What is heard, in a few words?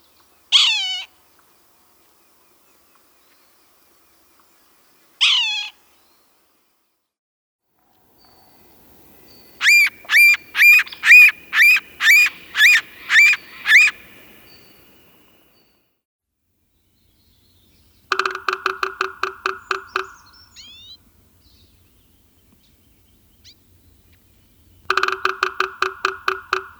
Red-naped Sapsucker